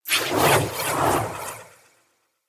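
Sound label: Staff Caster Projectile Whizz By Heavy; Weapons; Player; Staves; Starter Staff Caster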